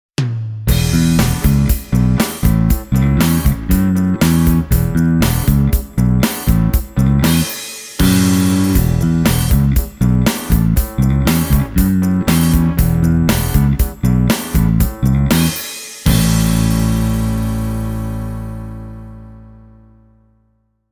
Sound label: bluetone bass 200 jazz bass